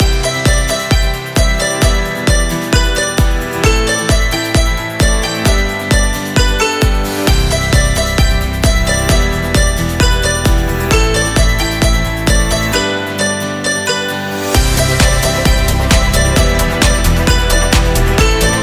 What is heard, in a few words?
The Chinese New Year